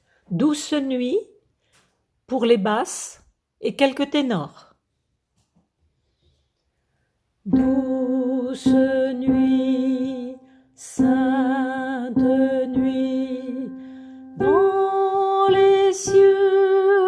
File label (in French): douce nuit hommes